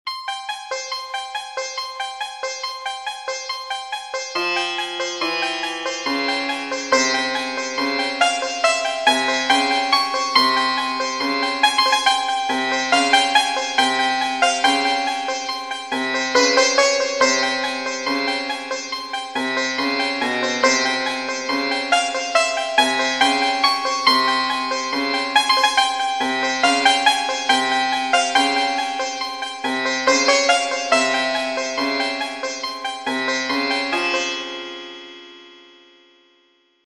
ancient ruins sitar

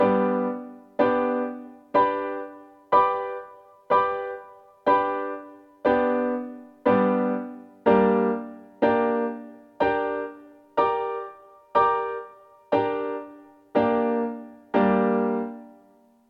triad and inversion block chord in G and Gm

